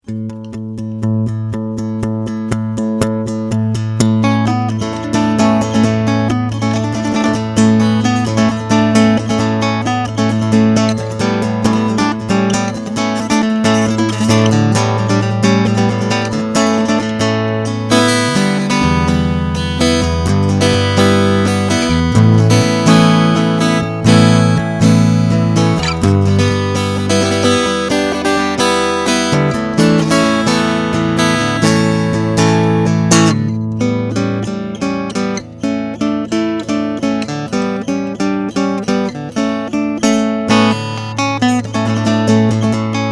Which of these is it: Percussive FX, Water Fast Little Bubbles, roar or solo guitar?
solo guitar